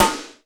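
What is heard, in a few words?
GATE snare; Crypton; DrumBase5000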